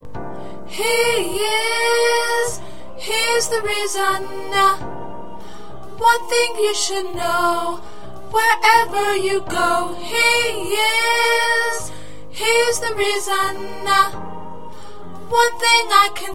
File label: Alto